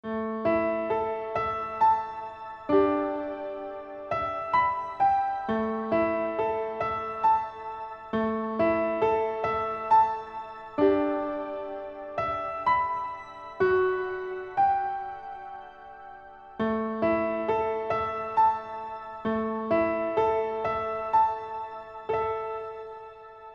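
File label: accompaniment high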